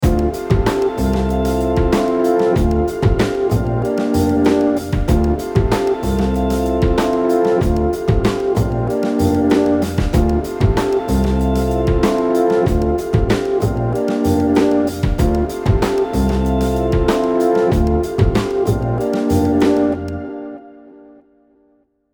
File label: loop